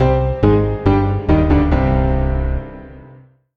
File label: MUSIC EFFECT Piano Negative; stereo; Universal Sound FX; MUSIC EFFECTS